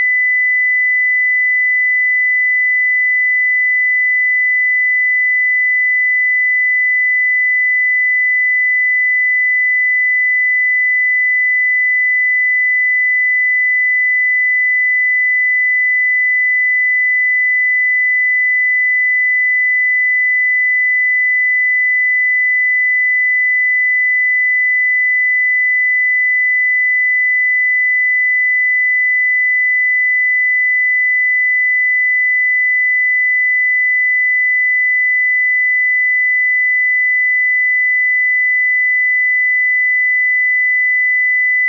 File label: DC tone; Telsa Recorder Server; ivr